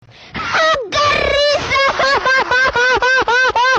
que risa

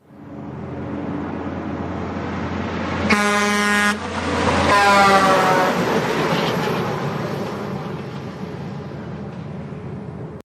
camion

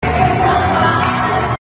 risa de animal

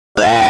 erupto

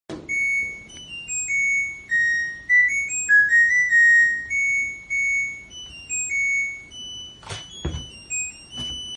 lavarropa

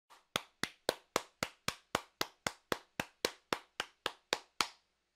aplausos individuales

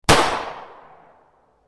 tiro pistola

